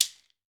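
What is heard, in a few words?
GHANA shaker, African Perc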